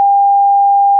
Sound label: 800Hz sine wave